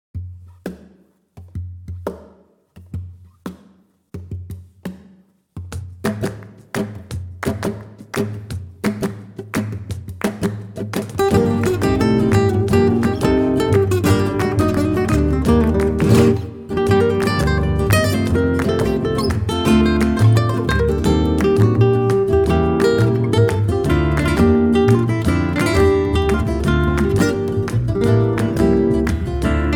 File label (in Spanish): Tangos; Sonata Flamenca III